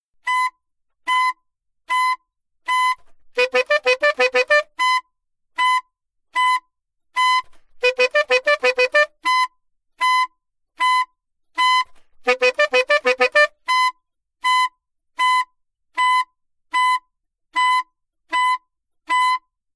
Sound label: altosax